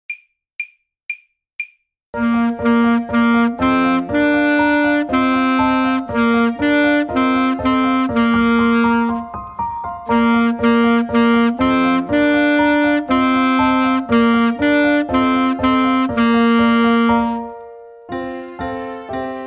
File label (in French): saxophone; mib